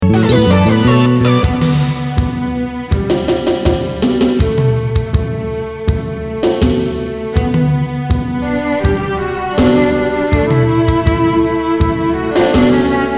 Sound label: Timpani, Timpani dans l’arrangement